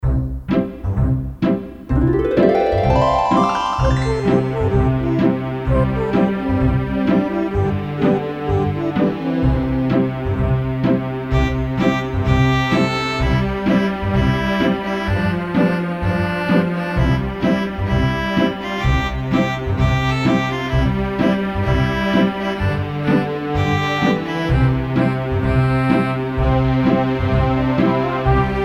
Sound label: Casio GZ-50M; Settlers Intro